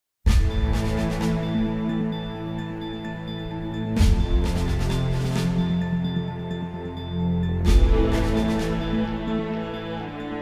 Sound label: fireworks